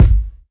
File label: warm sounding bass drum one shot g sharp key